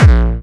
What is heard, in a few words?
Hard Kick